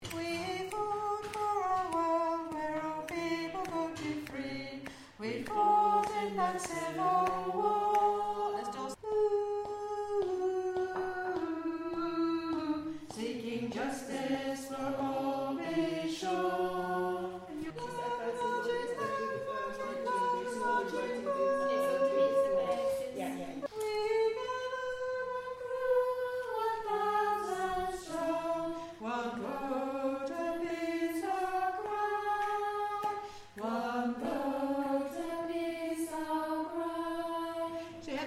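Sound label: Soprano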